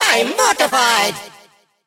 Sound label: evil hurt